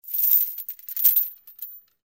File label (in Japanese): 鎖の音; dungeon